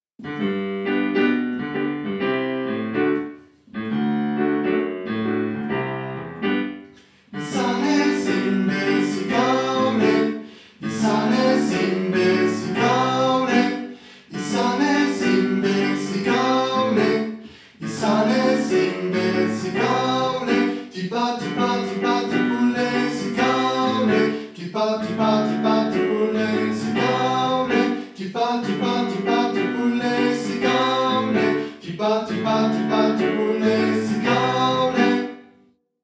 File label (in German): audio 3 stimme